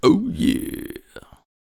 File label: Tank Attack Vocals